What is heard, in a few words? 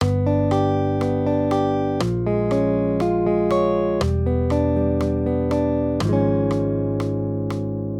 maj triads; G-Db; accompaniment